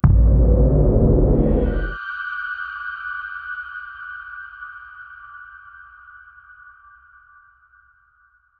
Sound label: Tension Builder Impact